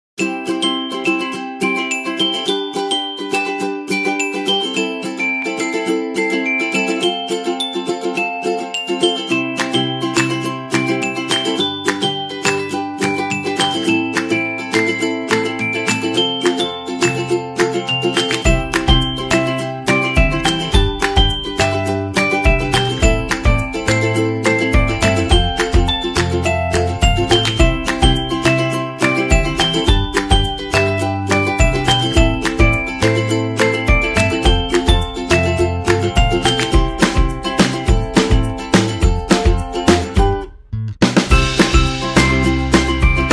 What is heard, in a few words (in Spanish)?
Música divertida y alegre para Niño